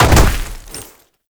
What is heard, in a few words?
Land Heavy Dirt; Movement; Warframe; Uriel